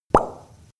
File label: blop